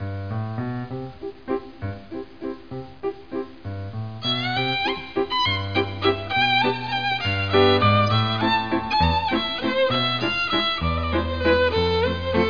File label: violi; cdromtoday